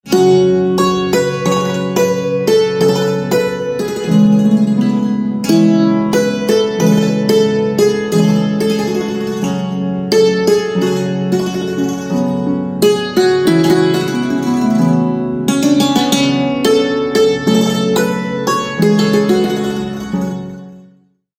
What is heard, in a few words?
Santoor Instrumental Ringtone